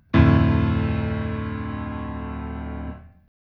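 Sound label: D minor lowest, chords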